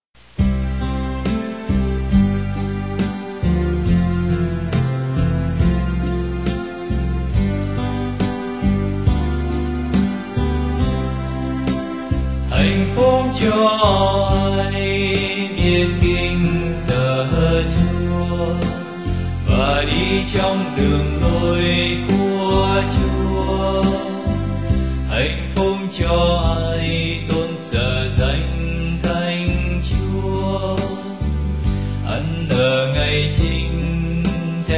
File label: BASS